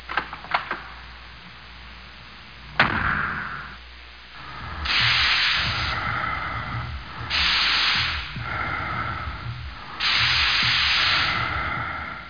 WW 1 GAS; Museum of Anything Goes

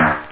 timbale, snare; da capo vol1; drums

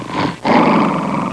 tiger growling; jungle animals; Sound Effects for Multimedia - Volume 1

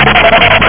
skid

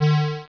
Pulse; Grid Warrior™ Demo

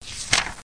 PAGE; MOBICLIC 13

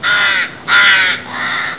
ROOK

